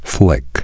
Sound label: flick